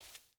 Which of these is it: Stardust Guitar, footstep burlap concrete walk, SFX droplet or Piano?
footstep burlap concrete walk